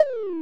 goal; N64; YOSHISISLAND

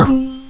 Dulcimer ish; PC; MARIOSFUNDAMENTALS

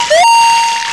Scanner Conform; GAMECUBE; LUIGISMANSION

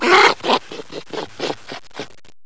Animal Noise; PC; MARIOTEACHESTYPING2

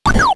fireball; SNES; mario; SMRPG

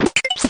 switch activated; N64; YOSHISISLAND

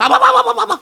"owowowowowow"; DS; ML BOWSERS INSIDE; Luigi

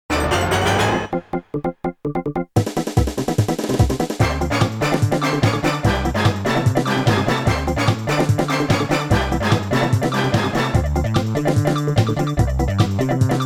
Metallic Mario; N64; SUPERMARIO64

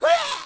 "Wuh"; DS; NEWSMB

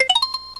message Box Hit; N64; YOSHISISLAND

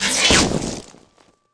Electricity Zap Splat; PC; MARIOTEACHESTYPING2

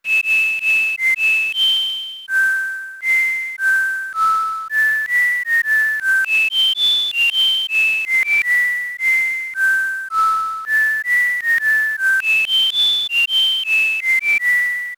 shower whistle; SNES; mario; SMRPG

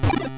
save album; GBA; MLSS

